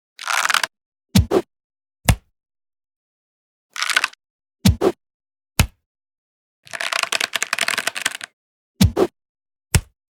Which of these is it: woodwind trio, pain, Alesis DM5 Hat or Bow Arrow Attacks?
Bow Arrow Attacks